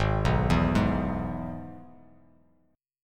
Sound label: Ab Minor Sharp 5th Ab down; Abm#5 arpeggiated; JGuitar